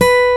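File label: B 3 2 OVATION, acoustic guitar; AKAI S6000 CD-ROM - Volume 1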